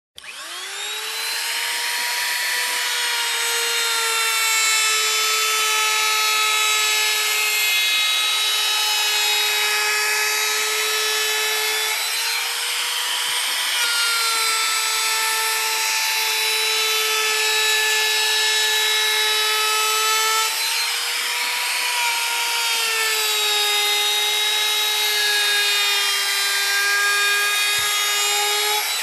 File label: Construction